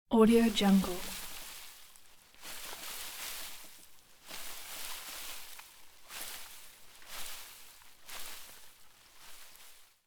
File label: shaking spruce tree